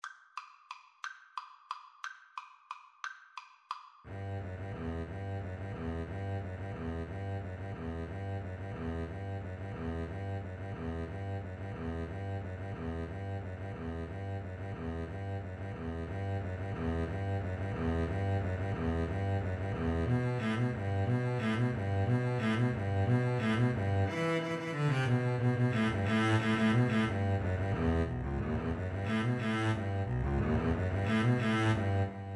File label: Double Bass Duet